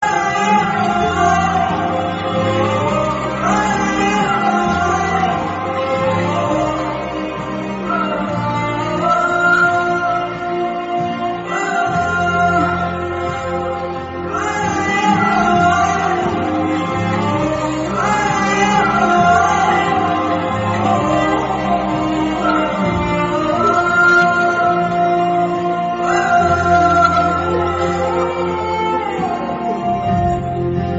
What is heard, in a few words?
darbar sad bgm